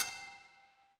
door doorbell

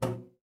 cartoon bonk

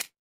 cartoon stretch

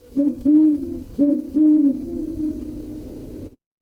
animal owl

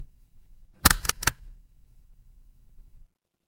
office stapler

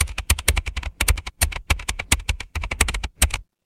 office keyboard

